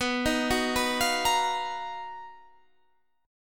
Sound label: B Major Sharp 11th, Guitar, Standard; BM#11; arpeggiated; JGuitar